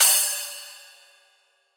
Riddim Crash; Hats & Cymbals

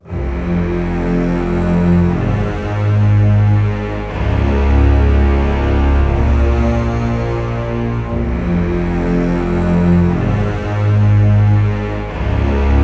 Basses - Fm 120Bpm; Construction Kits; STEMS; Ghosthack; SkyRealm; Kit 25 - Vvardenfell

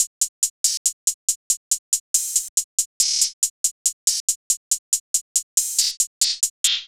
Hihat Loop 140 BPM; Plug Talk; Cymatics; Fiji Hihat Loops & Percussion

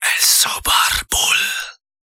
Whisper Vocals Am 70Bpm; Construction Kits; Ghosthack; SkyRealm; Kit 20 - Sea Of Souls

